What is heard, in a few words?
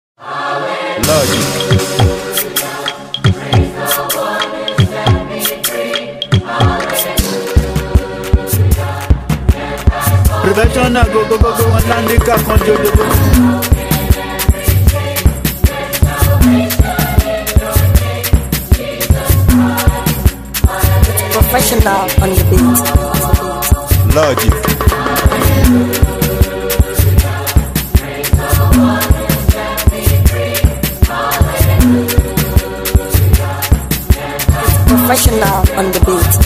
Hallelujah Mara Dance Beat